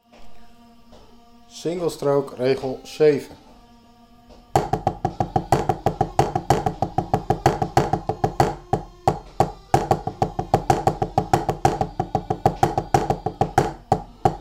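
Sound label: Single stroke roll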